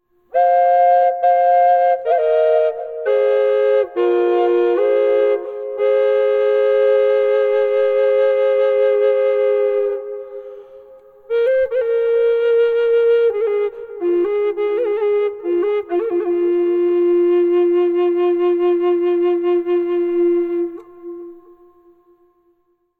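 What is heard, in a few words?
double flute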